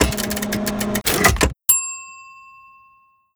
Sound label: present, gravity glove machine; mechanical units